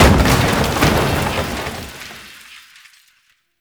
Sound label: Desk Destroy; Ambience; Gameplay; Warframe; Entrati